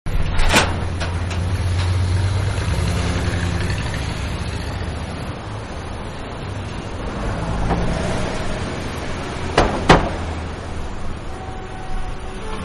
Traffic Medium; Trains; Vehicles; Manhattan Production Music

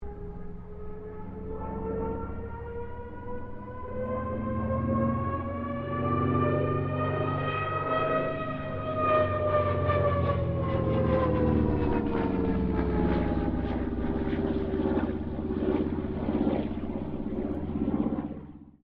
AIRCRAFT CA-13 BOOMERANG PASS STEREO; Airplanes; Vehicles

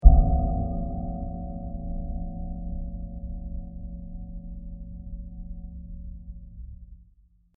Mysterious Deep Hit; Miscellaneous Impact; Impacts; Crafted Signals

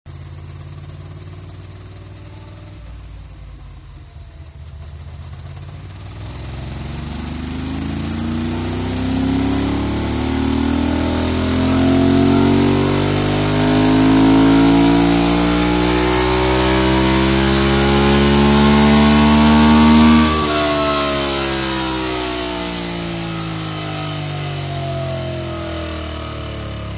Ducati Takes Off, Motorcycle; Vehicles; Partners In Rhyme

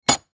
Metal Drop Set Down; Metal (Sound Effects:Materials); Blastwave

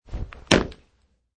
Landing Wood

